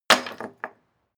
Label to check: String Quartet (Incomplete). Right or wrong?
Wrong